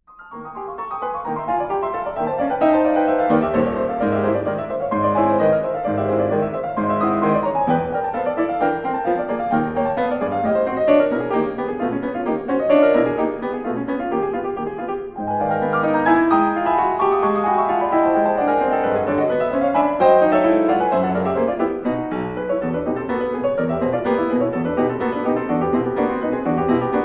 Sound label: Preludes for piano Allegretto Scherzando